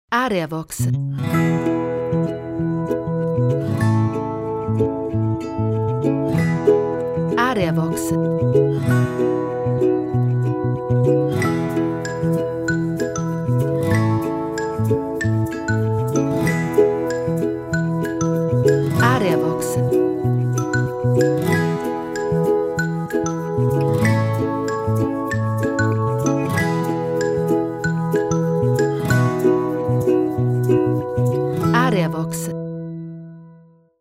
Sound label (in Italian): violini disturbato